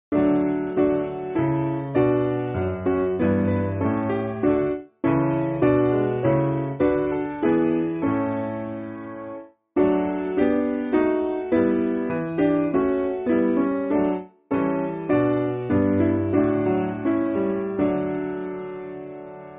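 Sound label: Piano, Free Music Backing Files for Churches; Lamp Of Our Feet; Epworth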